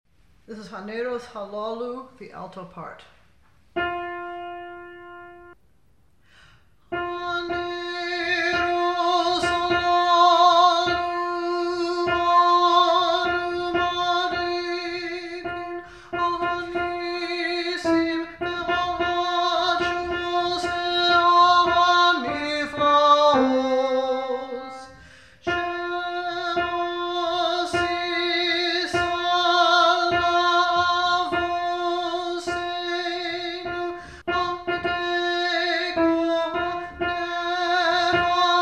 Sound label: alto; haneiros halawlu